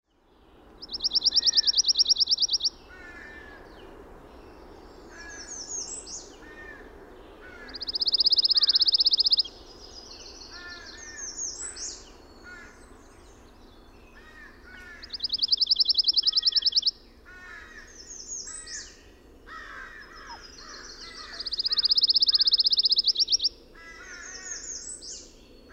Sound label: Pine Warbler Songs